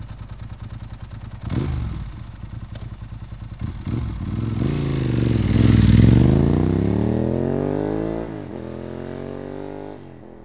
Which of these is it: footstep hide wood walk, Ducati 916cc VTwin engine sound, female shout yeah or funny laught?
Ducati 916cc VTwin engine sound